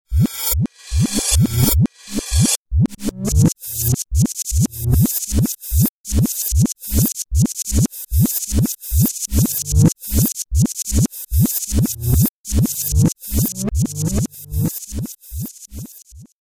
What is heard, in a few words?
reverse